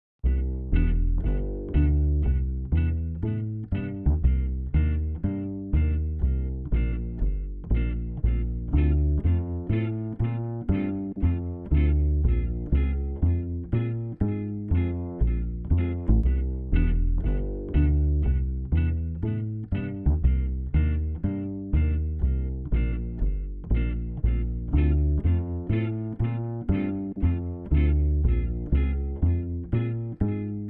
Guitar Bass Jazz Comp 120

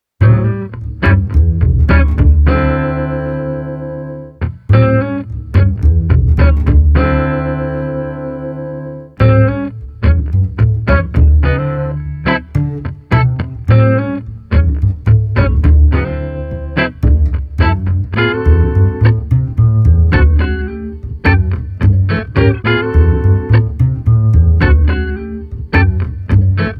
Street Funky Guitar Trio no Drums 107

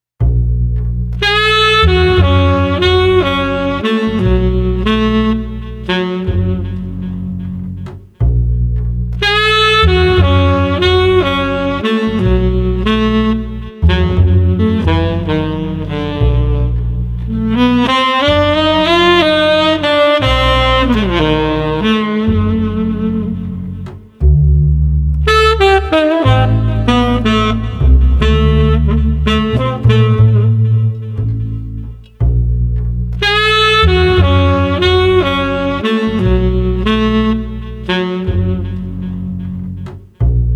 Sax Bass Intrigue 120

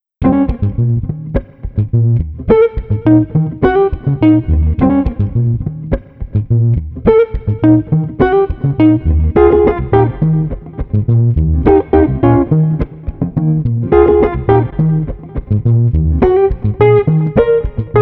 Street Guitar Bass Bayou 105

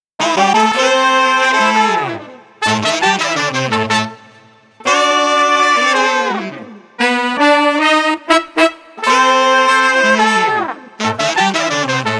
Street Corner Brass Party no Drums 115

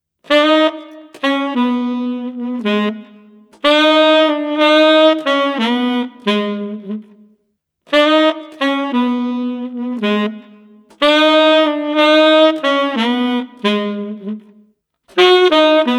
Street Sax Cafe Morning 120

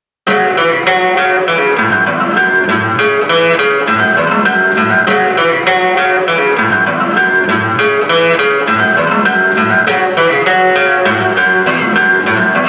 Slide Guitar Gritty 100

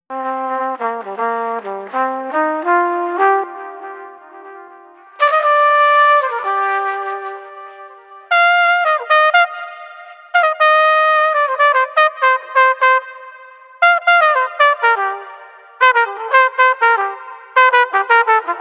Street Flugelhorn Somber 120

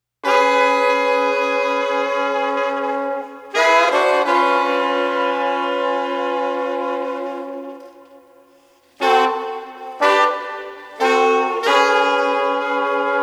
Street Brass Combo Pensive 120

